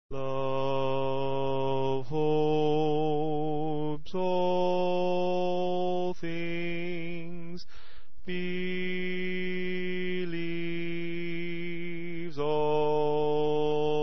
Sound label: The Greatest Commands Bass